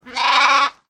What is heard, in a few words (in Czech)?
ovce sheep